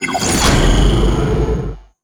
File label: striker start; gdi